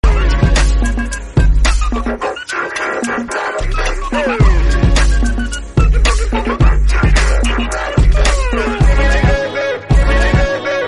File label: tecno sms